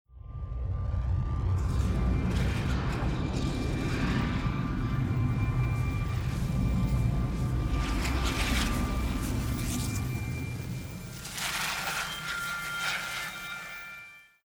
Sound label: Desert Comet Shoot At Ground; Journey